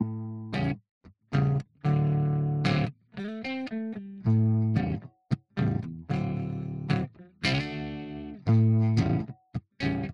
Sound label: Two Notes Torpedo PI-101 California style on axis overload